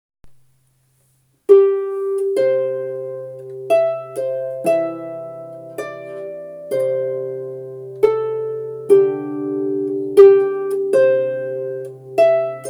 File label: Amazing Grace Both Hands, harp